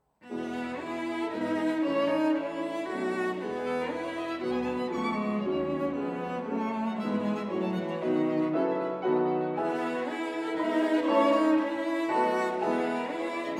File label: Piano Trio